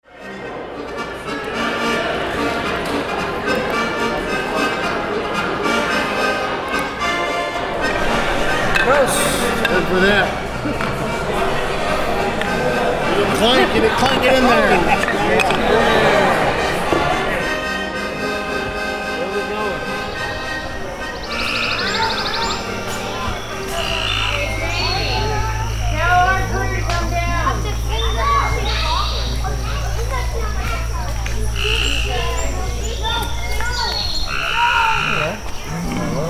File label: Socializing Ambient Sounds